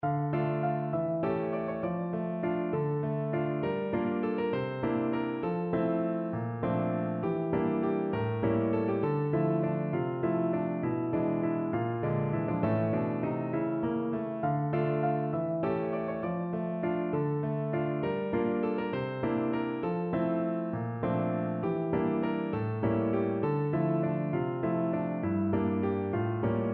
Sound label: PNO